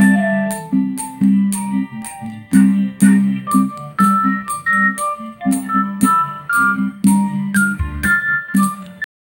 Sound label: local funky crunch; upbeat